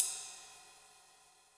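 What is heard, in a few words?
Sizzle Lite, Yamaha DTXpress Cymbal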